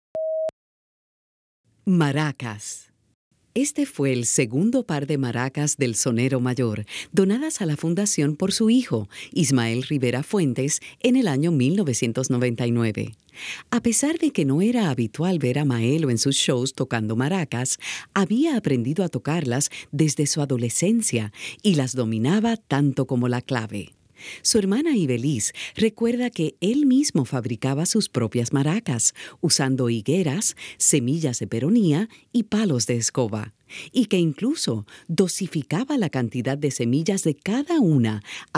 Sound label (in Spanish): Maracas